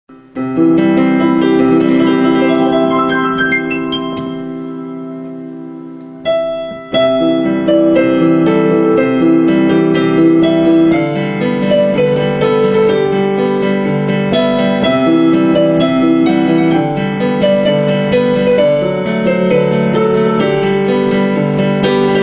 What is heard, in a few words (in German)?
Westpreussenlied Piano